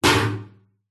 metal container bin empty set down on concrete mic inside pov; zapsplat